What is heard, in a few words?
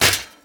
Menu Mechanical; Menu FX